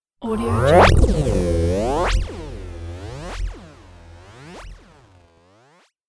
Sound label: electric glitch sweep